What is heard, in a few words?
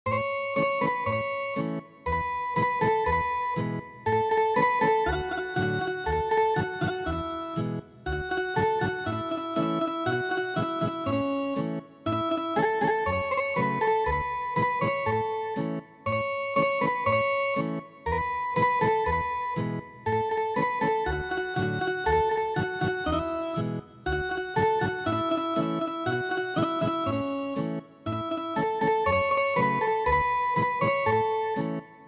Ethnic Guitar